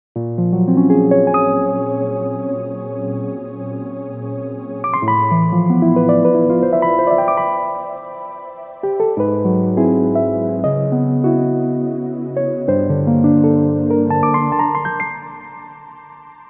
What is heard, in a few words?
piano